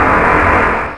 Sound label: damage; cstrike; Quake3